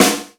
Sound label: SD, Yamaha RY-10, Drums